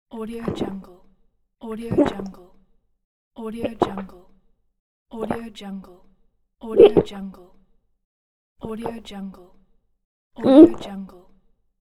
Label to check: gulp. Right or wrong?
Right